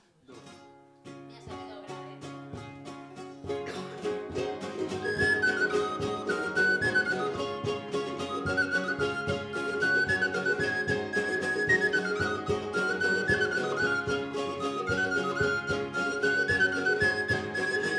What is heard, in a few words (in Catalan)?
Mateixa amb flabiol